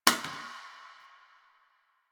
Janitor Plastic Spray Bottle; Warframe; Ambience; Nineteen Ninety Nine

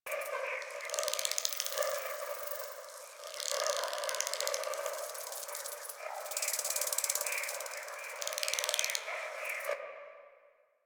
Landscape Mushroom Forest Loop; Warframe; Ambience; Venus Open World; Positional Stereo Loops

